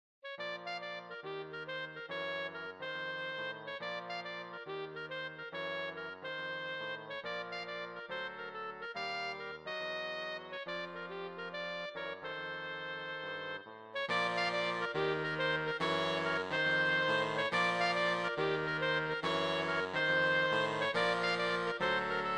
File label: jazzy swing song